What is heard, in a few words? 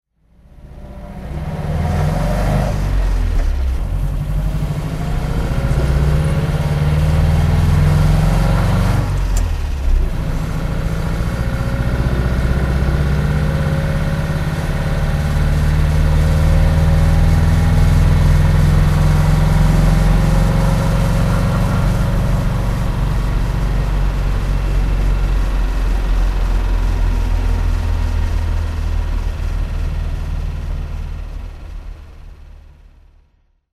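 peugeot 202 camionette driving, engine sound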